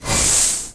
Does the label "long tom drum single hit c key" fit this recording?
No